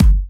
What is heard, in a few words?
Bassdrums